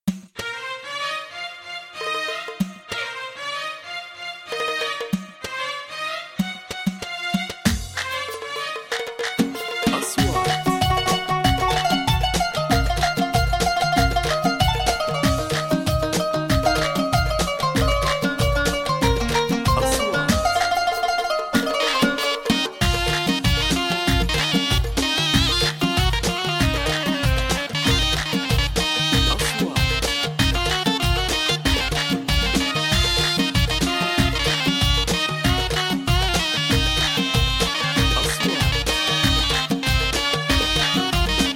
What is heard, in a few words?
watani